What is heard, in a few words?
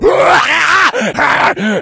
rage at victim, infected, male